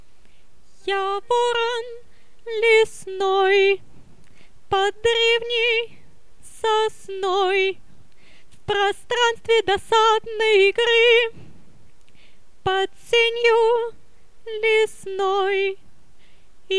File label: Crow